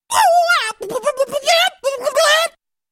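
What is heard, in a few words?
sound of a man imitating a rooster with his voice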